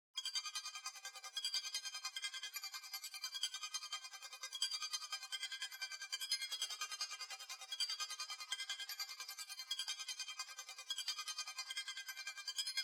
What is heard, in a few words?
151 BPM C Min Texture; Melody Loops; Loop Stems; Cymatics; Jungle